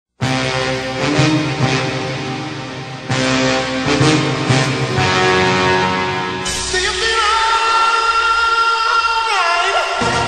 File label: Power Play Song